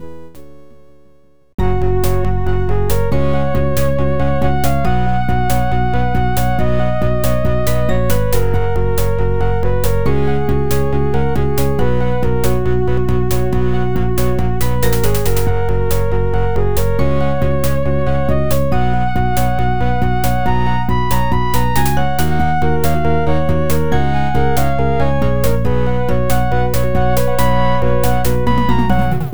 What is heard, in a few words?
Sound Blaster; 4D Sports Boxing; Mindscape; Hall of Fame